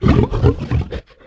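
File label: berserk search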